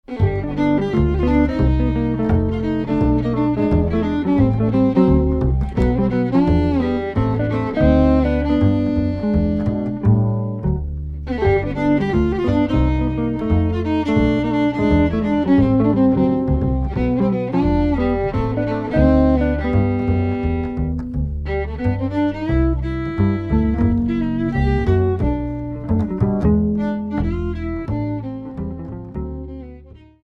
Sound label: violin